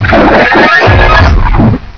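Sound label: die, alien controller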